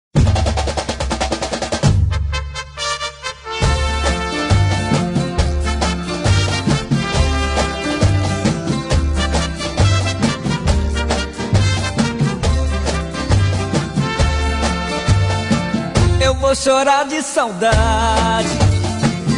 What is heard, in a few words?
Plugado Na Viola, Samba